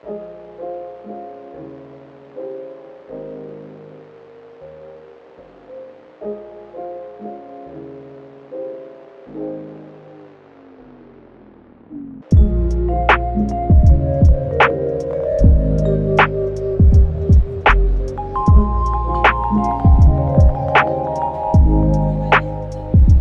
lo-fi

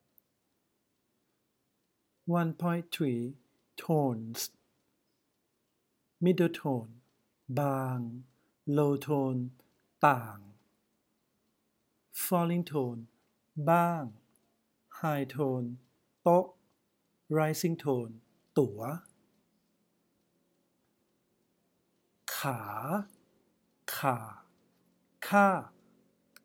tones

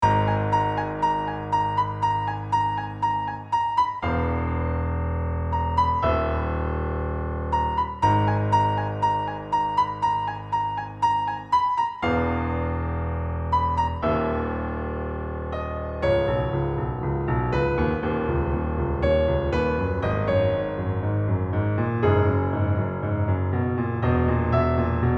Dissonant Tension

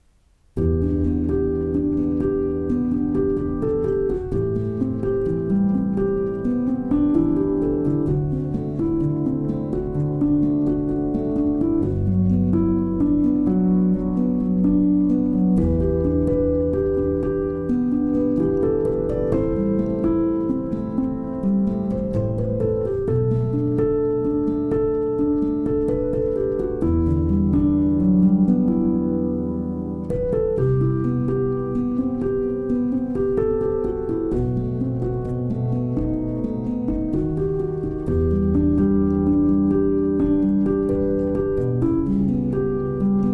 Piano

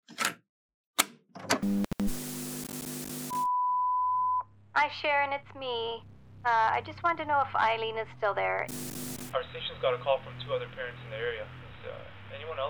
trailer with music

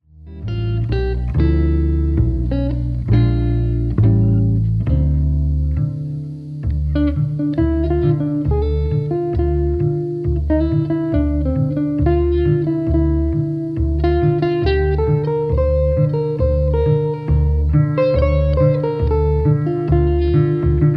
Bass Gitarre; Coda Club

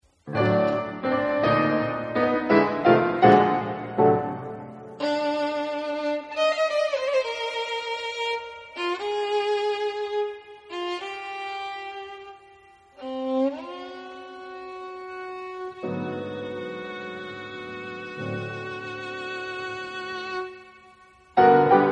Waltz

